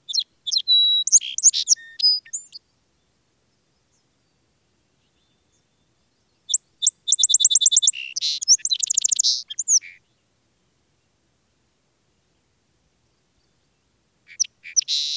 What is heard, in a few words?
Song Sparrow